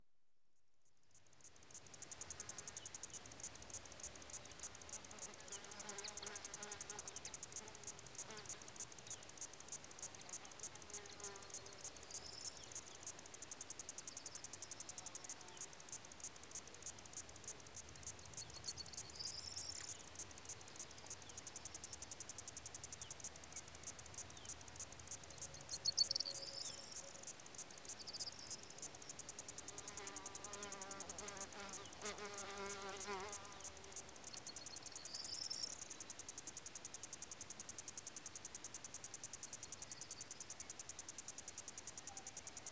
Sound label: Crickets And Other Insects; Greece; Lassi; BBC Sound Effects Library